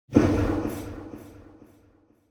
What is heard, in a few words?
Impact Drop Distant Shock Hit; Hollywood Edge; sound designer's toolkit v2